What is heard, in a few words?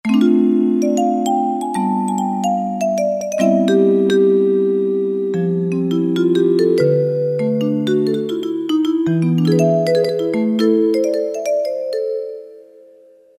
Vibe, Roland MT-32